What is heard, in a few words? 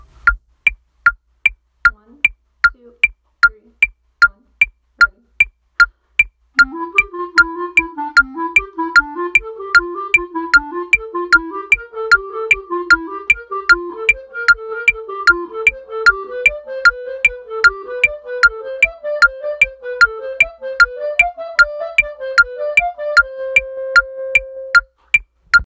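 trumpet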